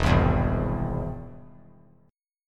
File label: E Augmented; E+ strummed; JGuitar